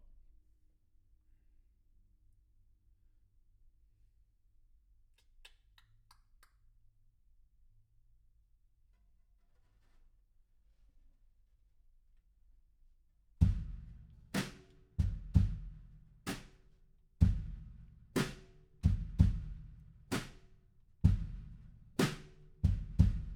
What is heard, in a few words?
Room A